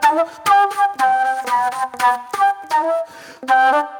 Spit Plate Flute; Sonic Foundry (Sony Creative Software); Manhattan Flutes; Session 03 - Classic Caddy

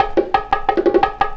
Bongo 175, Perc Loops, Drum&Bass; EZRollers

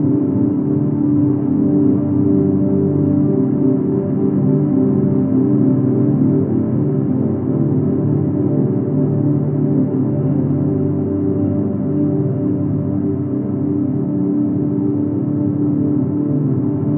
Flute Riff Cycle Hack

